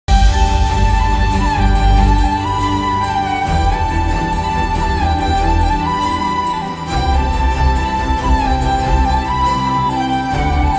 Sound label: love story bgm sad bgm